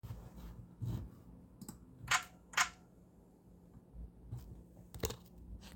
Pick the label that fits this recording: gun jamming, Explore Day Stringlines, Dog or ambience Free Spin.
gun jamming